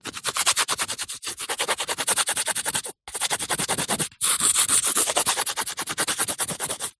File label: foley wood thin scrape rub on other piece; zapsplat